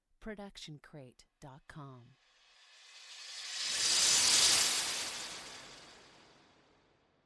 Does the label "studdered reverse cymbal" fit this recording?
Yes